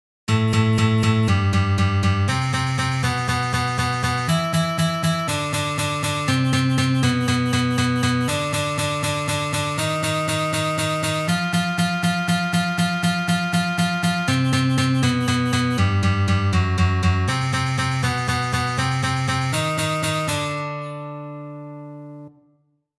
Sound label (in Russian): Uprazhnenie dlya igry oktavami